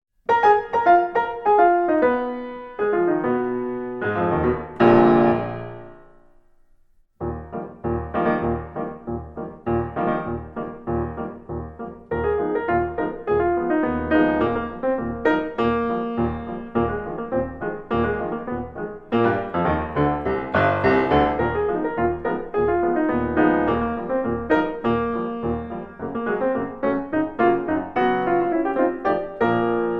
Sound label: Little Suite for Piano, Cake-walk; Keyboard Classics for Children; VI. Golliwog's Cake-walk